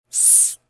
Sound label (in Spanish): cucaracha